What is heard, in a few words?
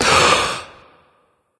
sigh; smite; hou yi